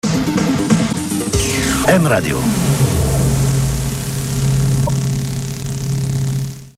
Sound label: jingle m radio